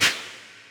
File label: MOVE; C64 Dreams; Multi-Sound Default